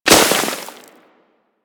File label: Melee Impact Dirt; Warframe; Weapons